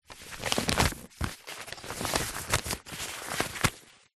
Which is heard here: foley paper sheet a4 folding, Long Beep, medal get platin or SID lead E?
foley paper sheet a4 folding